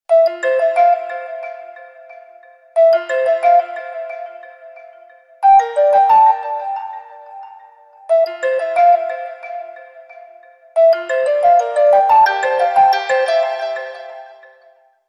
Pure Vibe

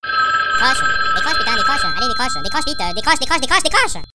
Dring Ring

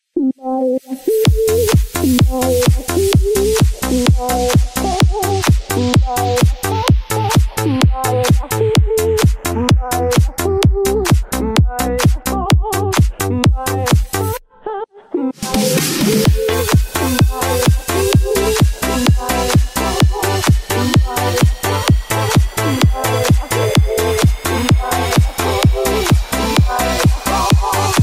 Throw back remix

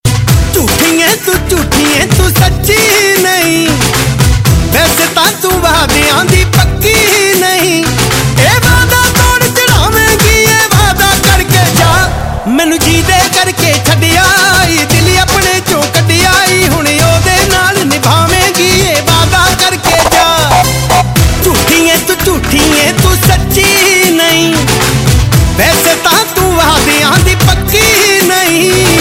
Punjabi

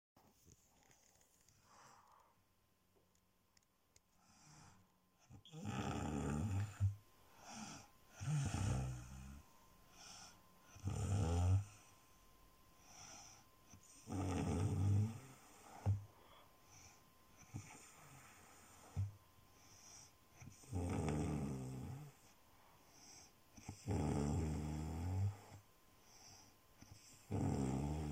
Snoring

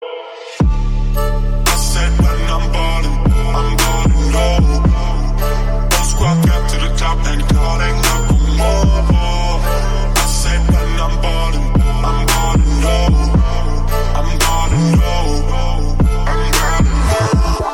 Scratch

